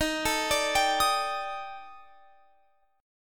D sharp Major 7th Flat 5th, down, Guitar, Open F; D#M7b5; arpeggiated

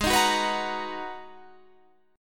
G sharp Minor 9th G sharp, Guitar Standard; strummed; JGuitar

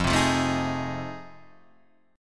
F 7th Sharp 9th, Guitar, Half Step Down; F7#9

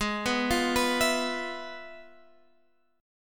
F Minor Major 7th Flat 5th, Ab

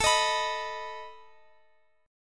A Suspended 2nd Flat 5th A down Guitar; Asus2b5 strummed

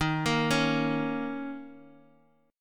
A sharp Minor Double Flat 5th, D sharp

